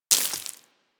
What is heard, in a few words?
Bullet Impact Debris Dirt; Warframe; Weapons